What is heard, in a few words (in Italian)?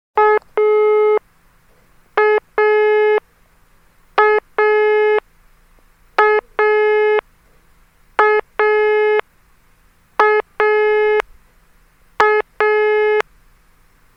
suono apertura telefono fisso Telecom Sirio